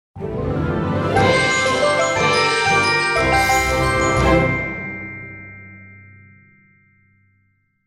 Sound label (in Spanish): fanfarria